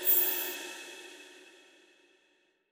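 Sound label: mystery symbol landed; netent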